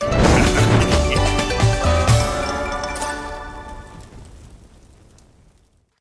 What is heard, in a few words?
medium Win; aloha